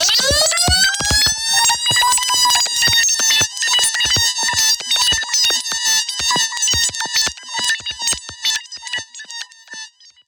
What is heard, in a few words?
Modu Proc B 140, alarm siren samples, 140bpm; musicradar